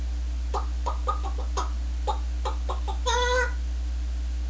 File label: chicken